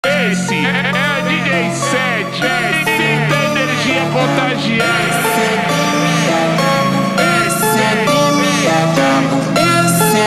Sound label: funk super slowed reverb brazilian phonk